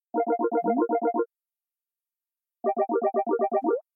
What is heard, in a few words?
Ring Ring; Teams